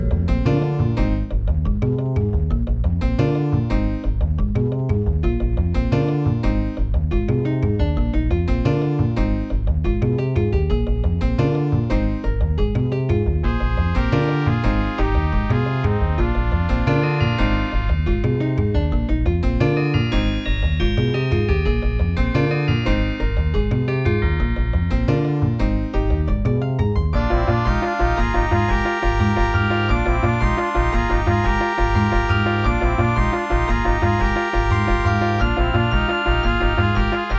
turtlebeach tropez; Chips: WaveFront ICS2115v, Crystal CS42; soundcard test; Hocus Pocus; Soundcard test page

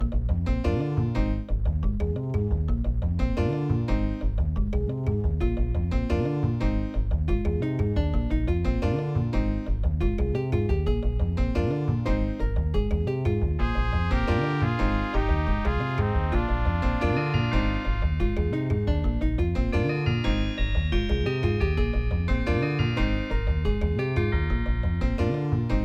turtlebeach maui; soundcard test; Hocus Pocus

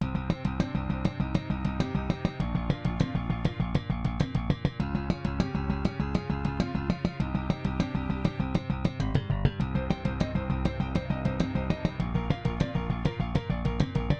avm apex; Soundcard test page; Hocus Pocus

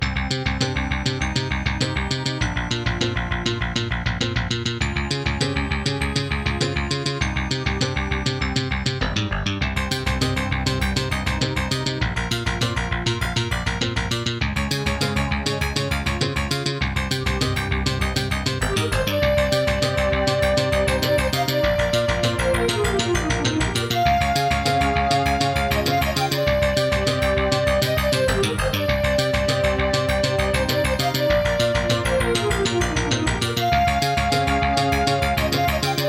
intro, Creative WaveBlaster ct1910; soundcard test; Hocus Pocus

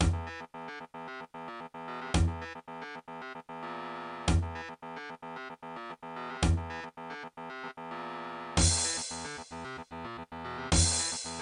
prometheus aria16 (ext); Phrometheus Aria16 (external player); soundcard test; Doom

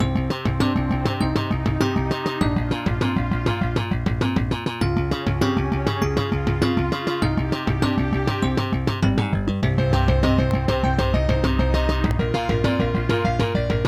aztech sg waverider32; Soundcard test page; AZTech SoundGalaxy Waverider 32 Plus; Hocus Pocus

